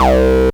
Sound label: Acid Sounds C, Synths; Vengeance Samples